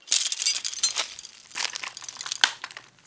cliff player big jump jingle; Modern Warfare

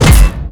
biomech lancer punch hit

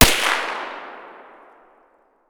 fire distant; Escape from Tarkov

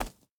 boar footstep dirt hard; Rust

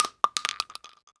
shell bounce, weapons, shells; ModernWarfare

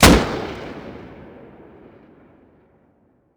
ak74u attack; Rust

